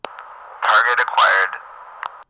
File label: Target Acquired